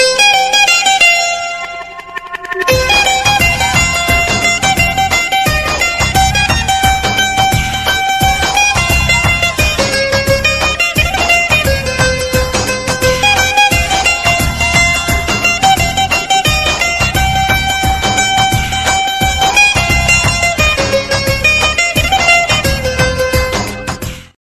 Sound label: oriental guitar ringtone